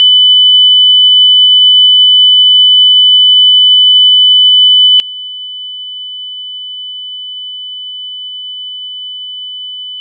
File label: loud soft; faac; android 9.0.0